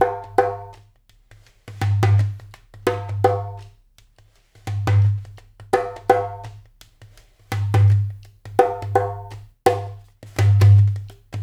DUMBEK; Spectrasonics; Liquid Grooves 1 & 2

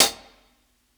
60s HH SOFT, HI HATS; Basement drums vol 2

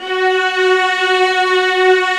F#3, st arco violas

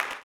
CLAP, Blues Soul; Spectrasonics Vocal Planet

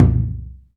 PER, Percussion

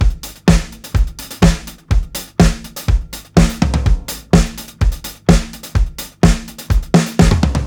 125 4 FLOOR W FILLS, DRUMS LOOPS; BASEMENT DRUMS VOL 2

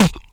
VOC DRUM, MOUTH PERC; Best Service Hyper Dance

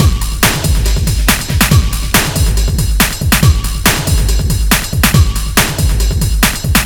808 Roar at 140 out; Sonic Foundry (Sony Creative Software); Ugly Remnants VOL-2

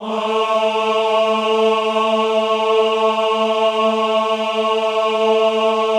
AHH A2, Classical Choir; Best Service; Extended Classical Choir; AHH MIX

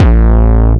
BD Overdrivezzzzzz, DRUMS; Polyend; Legowelt

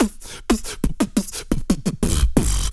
SPITTLES; Spectrasonics; Planet

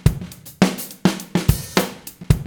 BEAT, Beats 106-111bpm, Hip Hop; E-MU Formula 4000 Series Vol. 1; Hip Hop Nation

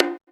Percussion, House

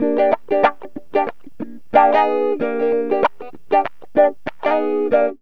GTR, CHANK; Zero-G; Funky Elements

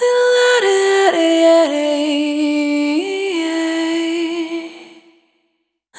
Single Voice Melodic Loops

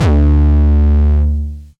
808 Dist - D2, Kicks; Mbase 11 Kicks

